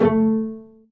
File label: string pizz, Akai S-1000, A2; Samples vol.55 - Retro Sampler